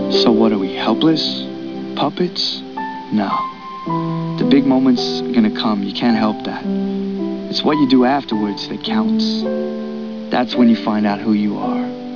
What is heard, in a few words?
whistler